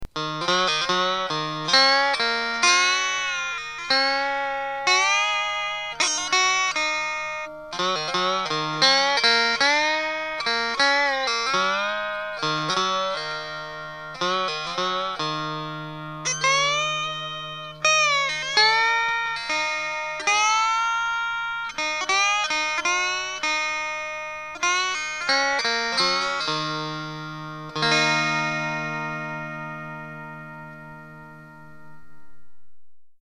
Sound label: tin guitar amp clean; junkboxinstruments